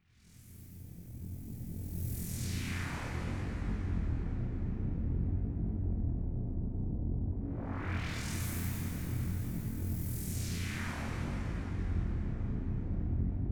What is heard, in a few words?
space surveillance scanner